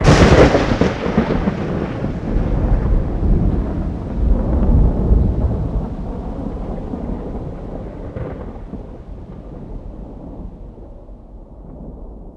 Lightning Thunder 1 shot; Journey

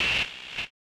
Hihat Open

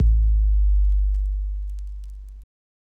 Kick Sub Dirt, Drums

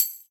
Tambourine Wood; Drums

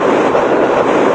ball roll; There; ThereClient; thereball

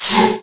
click out; There; ThereClient

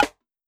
Snare Wood Combo, drums; One Shots; Ableton Live 12 Lite; Core Library

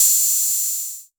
Hihat Open 606

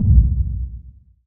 FX Sub Verb; FX Hit; Drums; Ableton Live 12 Lite; Core Library

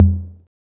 Tom; Ableton Live 12 Lite; Core Library; Drums

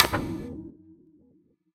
E-Perc Strike Charred, Electronic Percussion; Drums; Ableton Live 12 Lite; Core Library

